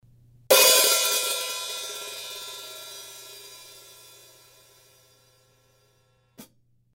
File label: S Hats Open Hit, cymbal sound